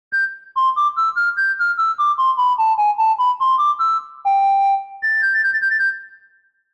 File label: Flute, Jupiter8; OPXPROII; opxpro2